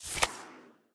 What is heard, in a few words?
play card; squares